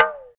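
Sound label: TALKDRM, talking drum; Spectrasonics; Heart of Africa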